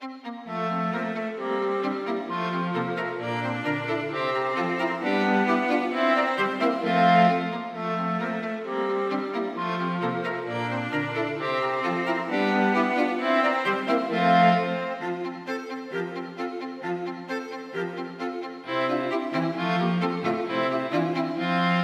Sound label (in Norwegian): Fejer for strykekvartett og klarinett